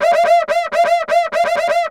Screamer; Sound FX

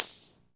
Hat Open, Drum Samples Roland CompuRhythm-78

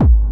Hard Kick

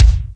Bassdrum, Roland D-70; Drum Samples

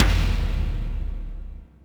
Yamaha RM 50 Toms; Drum Samples

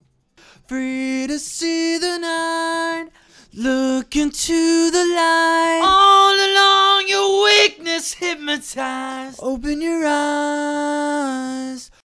dreams chorus

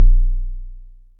kicks, Bass Drum

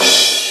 Crash, Yamaha TG-33; Drum Samples

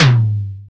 Tom, Roland Digital Drum Brain DDR-30

